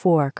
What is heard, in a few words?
Fork e